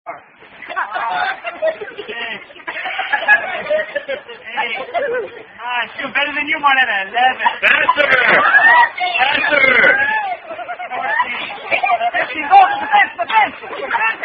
Dizzy Stick, Drinking Game Played While Not Drinking